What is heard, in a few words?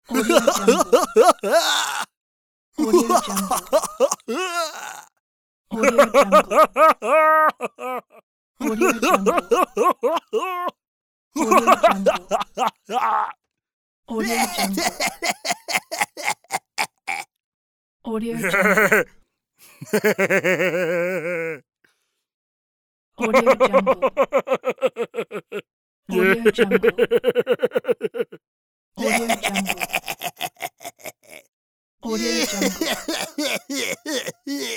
idiot laugh